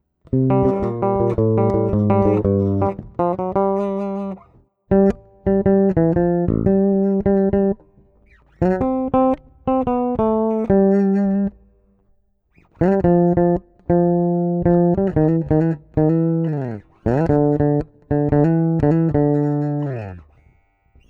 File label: MELODY